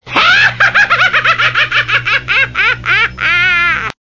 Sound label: laugh; classictv; terrahawks; zelda